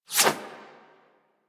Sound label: CY Xbow 2D Whoosh C; Tenno